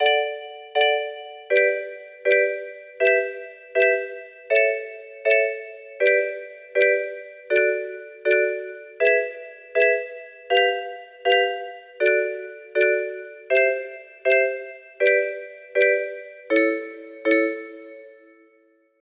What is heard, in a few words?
music box